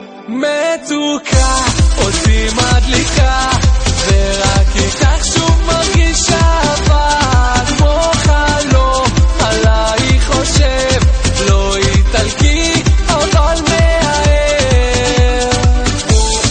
italian lover tune, ringtone

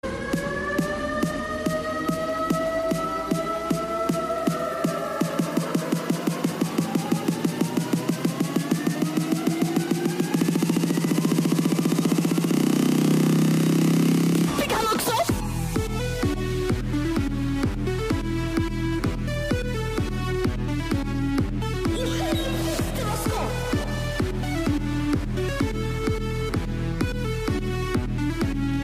belgium trance

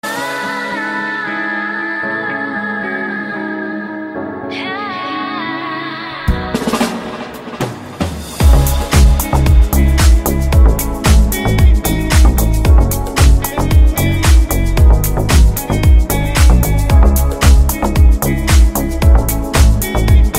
iphone8, ringtone

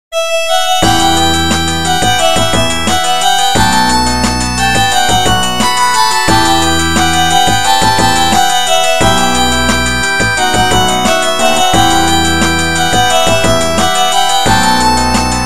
galaxy s9 violin sonata

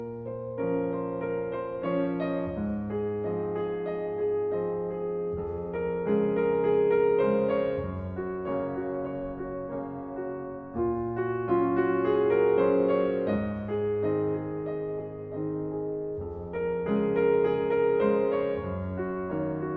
piano music

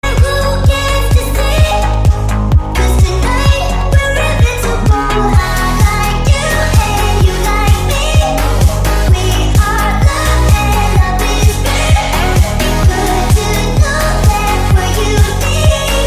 electro

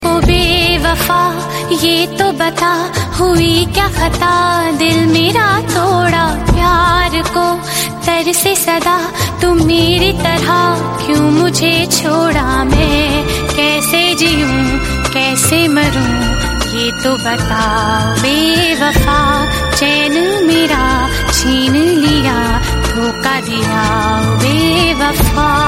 sad Hindi ringtone

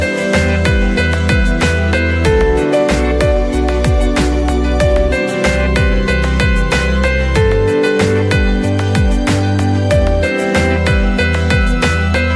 nice piano

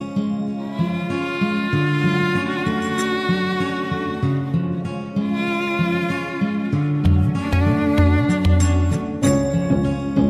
sad turkish

